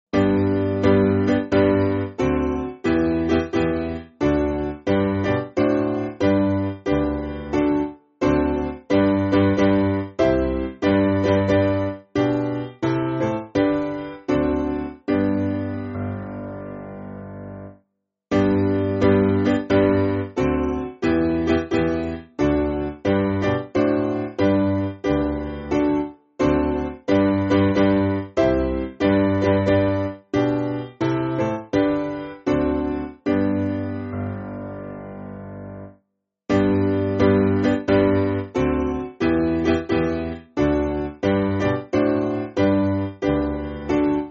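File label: Piano, Music Backing Files for Churches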